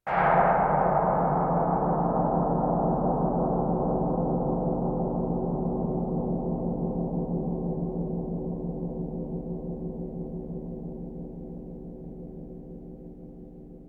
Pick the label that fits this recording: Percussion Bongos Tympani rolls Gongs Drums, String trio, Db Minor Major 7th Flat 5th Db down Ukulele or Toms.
Percussion Bongos Tympani rolls Gongs Drums